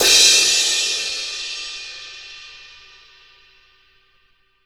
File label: CRASH; 90s sample CD